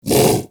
Vocal Bark; Hunting Critters; Predasite

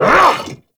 Vocal Attack Short; Warframe; Duviri; Dax

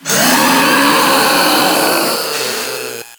Proto Infested Ancient Death Vocals; Warframe

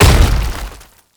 Tank Infested Step; Enemies; Movement; Lasrian Tank; Warframe

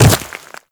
Quadruped Medium Land Dirt, Footsteps; Warframe; Infested

